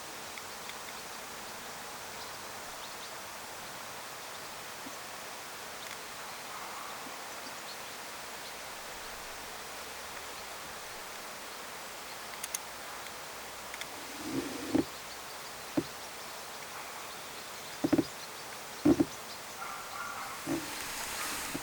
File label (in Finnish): jalleen tiklipesue